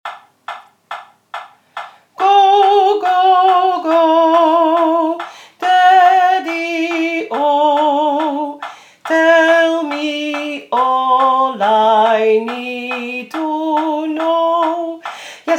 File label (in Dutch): canon